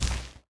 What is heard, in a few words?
lightning aura zap